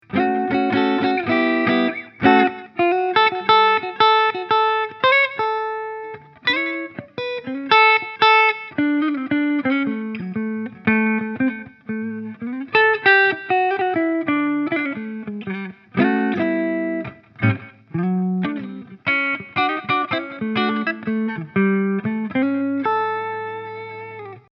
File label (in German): Halstonabnehmer clean